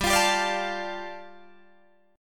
G-7th Suspended 2nd-G-17,20,17,19,20,17 down Guitar Drop D